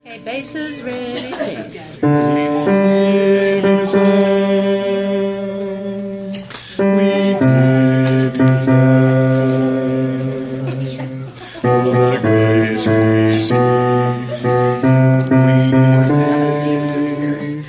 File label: We Give You Thanks bass